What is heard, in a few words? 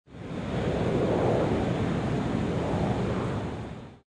Airport, City Backgrounds